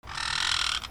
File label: BIRDS